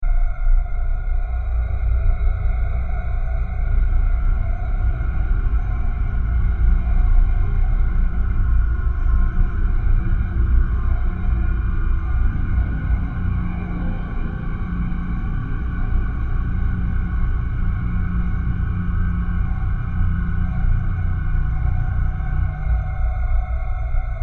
Dark Horror Ambience 130bpm; Scary Soundscape; Crafted Signals

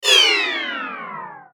Descend Power Down; Descending Sound Effects; Blastwave

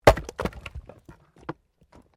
Wood Chopping Axe; Objects

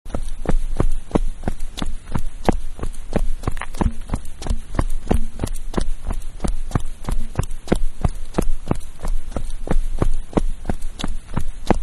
Running-On Black Top; Human

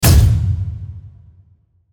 Hit Reverb; Blastwave

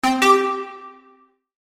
Chime Musical, Chime Musical Multimedia; Web Interactive; Chimes (Sound Effects:Multimedia); Blastwave; Technology

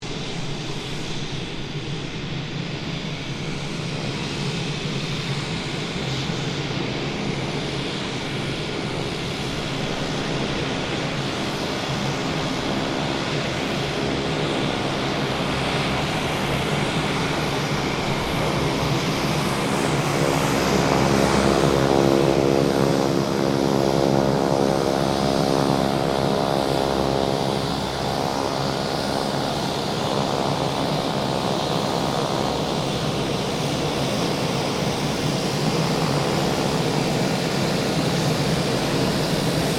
AIRCRAFT PC-9A SQUADRON 5 TAXI; Roulettes air display; Airplanes; Vehicles

